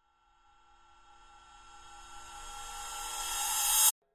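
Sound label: Cymbal Reverse Approach Soft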